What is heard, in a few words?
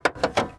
mech latch; Sid Meier's Civilization 4 Complete; Beyond the Sword; Units